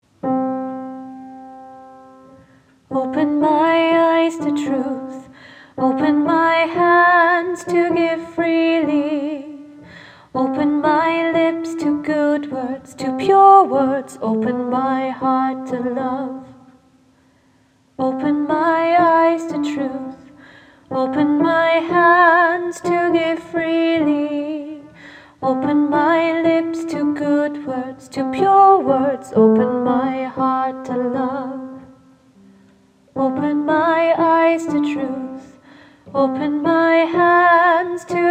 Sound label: Open Alto Lead